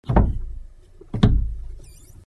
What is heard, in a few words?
window opens